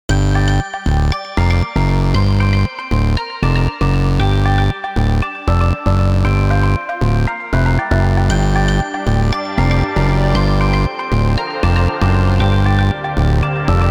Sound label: forest funk indie game music; super meat boy